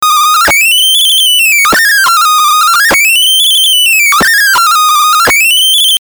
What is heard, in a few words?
weird little melody; A little melody that I don't know how I got; Soundfiles from the modular